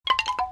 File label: accepted